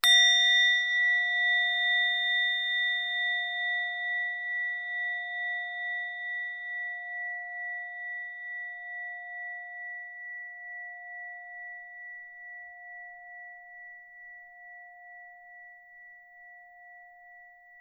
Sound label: High Bell